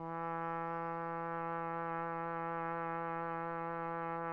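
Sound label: TPT, trumpet; Roland - LA Composer vol. 3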